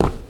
pl step; svencoop

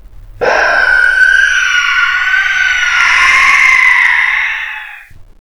monsters scream; svencoop

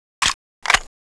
eagle reload, weapons; svencoop

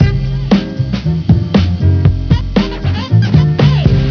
beat; svencoop; votemap

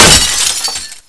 bust glass, debris

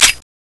slide release, fnh; weapons

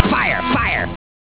fire

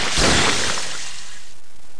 sp acid hit, tarantula; svencoop

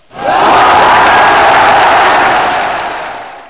woo; svencoop

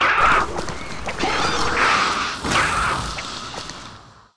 tail swipes, aliens drone; svencoop

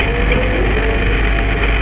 door move; gearbox

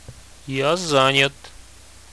busy; silo

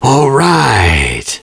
Cheer; svencoop; voice commands

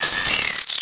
houndeye alert; gearbox

